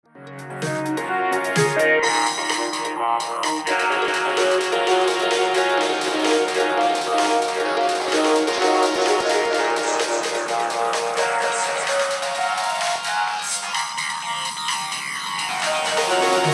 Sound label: delay